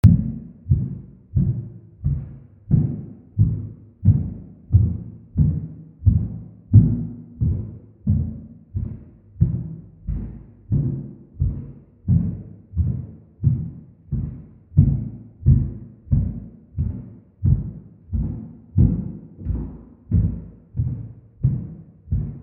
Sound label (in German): Trittschall, Schallschutz